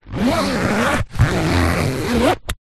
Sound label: laptop case fabric pouch zipper; foley